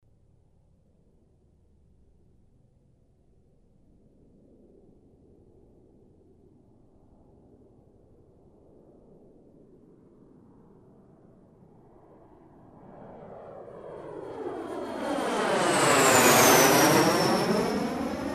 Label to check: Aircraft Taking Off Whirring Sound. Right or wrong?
Right